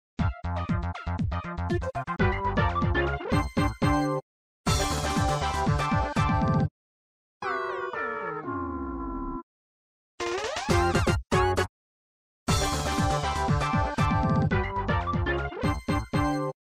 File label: Jingles; Orbulon; WarioWare Gold